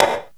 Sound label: SN REV, HIP KITS